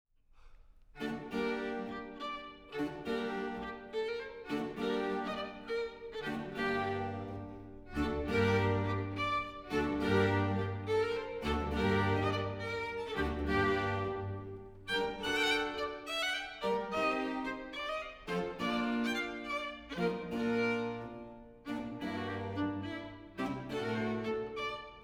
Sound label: jig